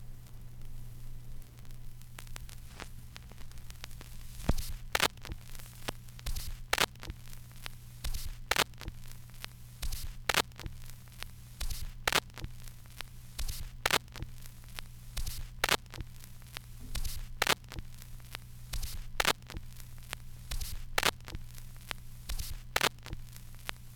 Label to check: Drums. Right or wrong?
Wrong